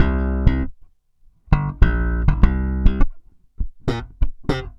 BAI BS C, BASS BAION

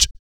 Blues Soul; BOOGALOO; Spectrasonics; Vocal Planet

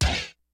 HOUSE KIT, DRUMS; Kid Nepro; Elite

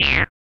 RAVE, RAVESYN

